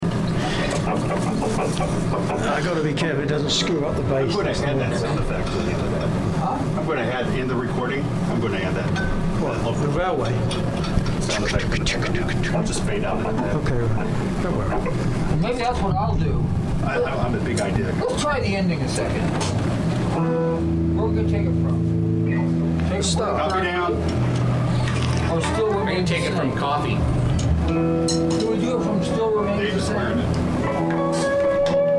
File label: Background Chatter